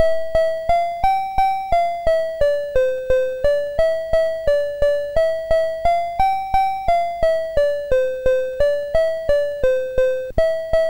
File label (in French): Hymne a la Joie BASIC, MOS6581R2